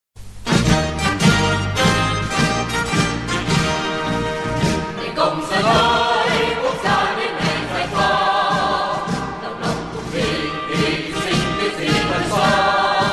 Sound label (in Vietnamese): Quoc ca VNCH